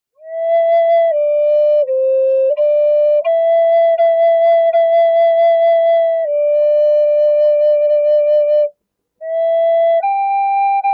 Mary Had A Little Lamb Key A

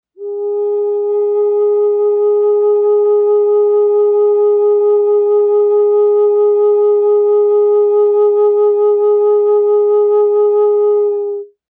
Large A Flat, Flutes Single Note

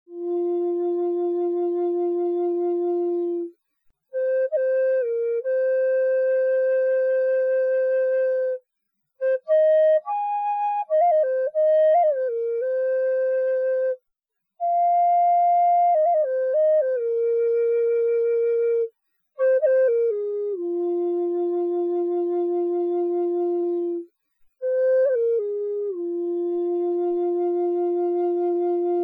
Low F White Oak, Flutes Long

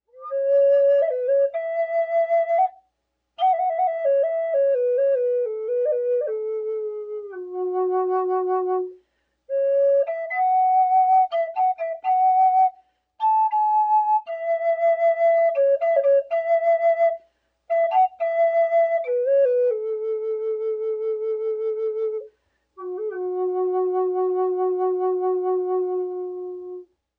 Large F sharp Curly Maple, flutes

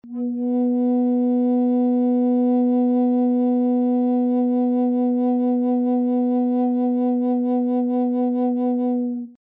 Bass B, Flutes Single Note

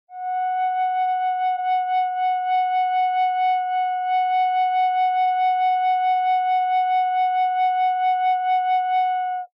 Flutes Single Note, Small F Sharp

